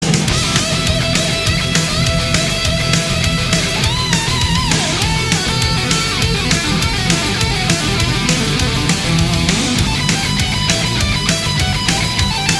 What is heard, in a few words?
Solo